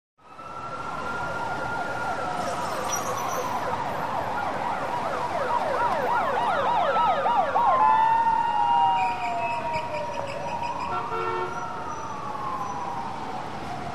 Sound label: Two Distant Sirens By In Heavy City Traffic.